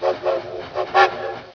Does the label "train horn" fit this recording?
Yes